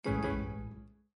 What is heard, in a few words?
Windows User Account Control; Windows 7 Sounds; Cityscape